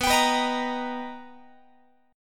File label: B Augmented Major 7th B Guitar, chord; B+M7; strummed; x,19,22,21,19,21